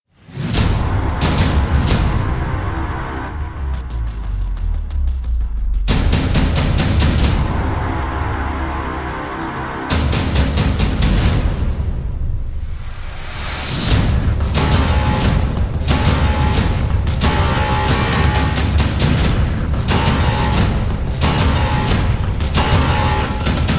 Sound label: trailer backends lockdown